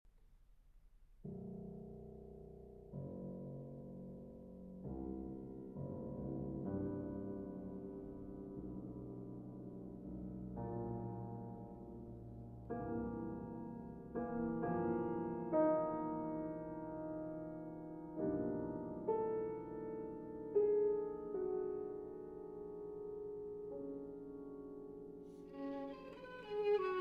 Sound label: Violin and Piano Sostenuto; Sonata No. 3 For Violin and Piano, Op. 124